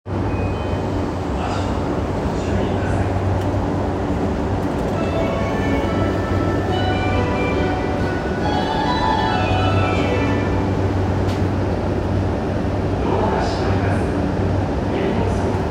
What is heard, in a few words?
train sounds; 南北線永田町南